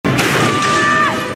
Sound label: groan; ginormica